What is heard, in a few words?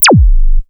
TJANGG, DRUMS PERC; Q Up Arts; Dream Experience